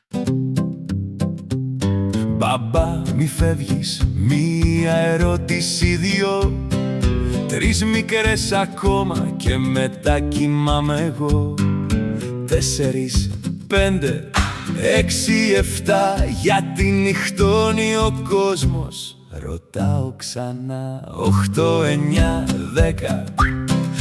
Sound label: campfire acoustic; the numbers